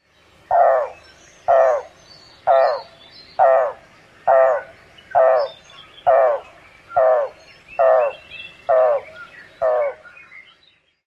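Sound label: Common Nighthawk aug-aug calls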